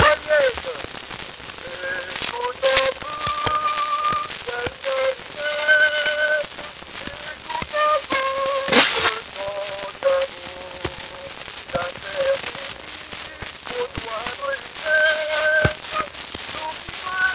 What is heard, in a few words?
Tyrolienne